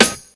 sharp snare drum sample D# key; snare drum samples

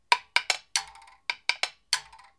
wooden temple blocks studio sample; percussion; loops

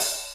bright open hi hat d sharp key

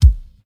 warm kick sample a sharp key; Mono

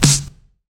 verby snare single hit c sharp key; snare drum samples

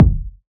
verby bass drum single shot c sharp key; kick

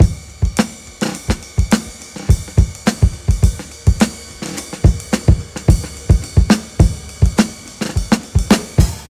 106 bpm drum beat g key

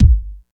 nice kickdrum g sharp key

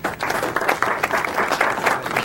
clear applause

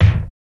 00s rich mid range kick drum sample g key

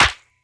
airy snare sound c sharp key; snare drum samples

